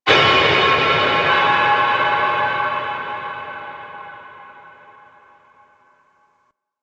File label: Hub Metal One Off; Steel Meridian; Warframe; Tenno